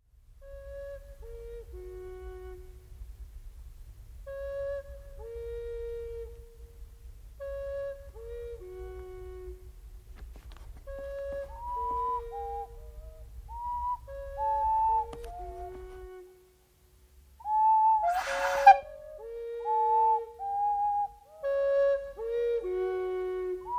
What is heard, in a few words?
ocarina; TOTORO